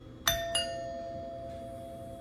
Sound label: Chime Sound